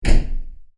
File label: Slamming Wood Door With Metal Door Handle